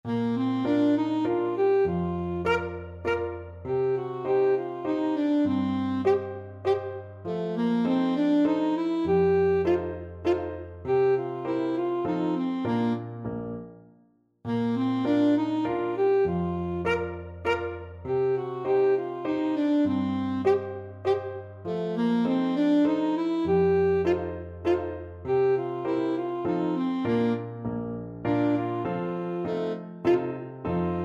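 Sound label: Waltz in A alto saxophone; Schubert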